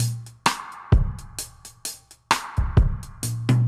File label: Drums A Wet 130, dub drums; musicradar